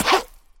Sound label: jump; sonic modern